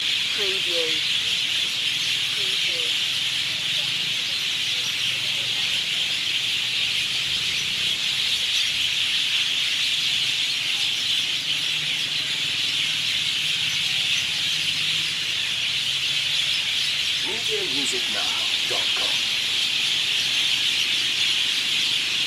crazy city birds loop

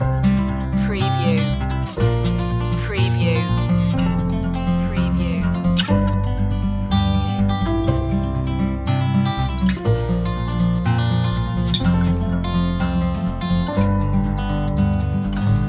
my home town loop

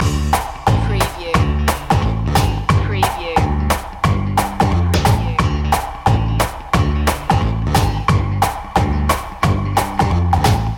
mambo loop

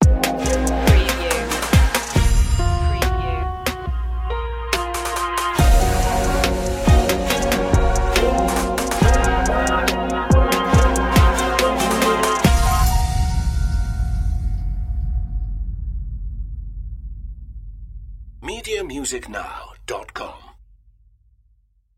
smooth soul vibes no vocals

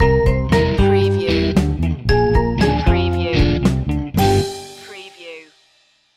childrens soft rock stinger

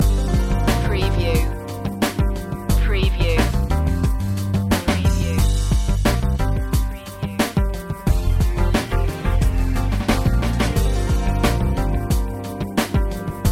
lounge living loop

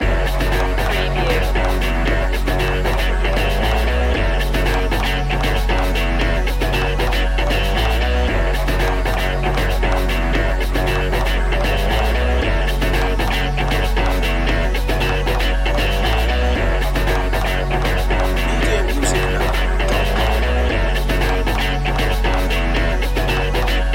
boots of fire loop

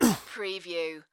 Pain Umph, Male Painful Grunt, Hurt

